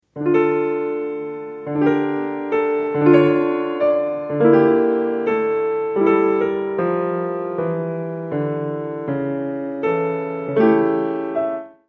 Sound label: sarabande devocalized